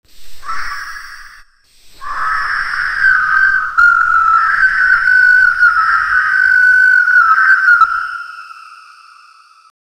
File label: a nightmarish creature, avian